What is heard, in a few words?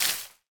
Leaf litter break; Ściółka